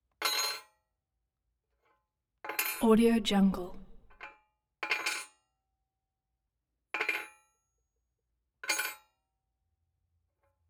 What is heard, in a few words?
wrench open spanner set on hollow sheet metal